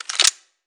reload light

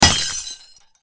glass break small

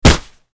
hit hard

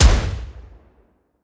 sniper shot

